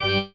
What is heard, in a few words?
marimba grave; ゆめ2っき